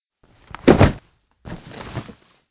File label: drop bag